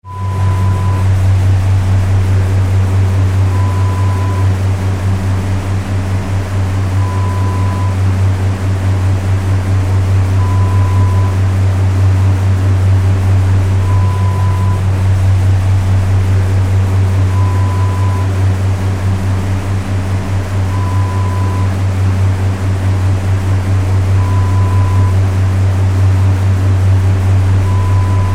Walk in Freezer sound

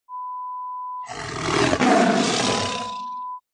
Tiger Roar

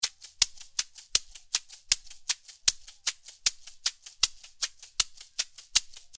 CABASA

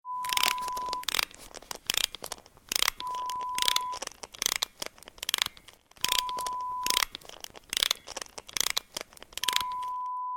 Wind up sound

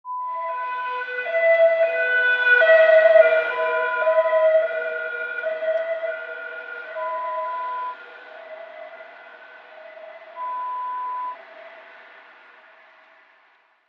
Siren sound

